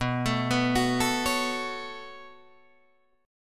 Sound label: B 7th Suspended 2nd, Guitar, Drop D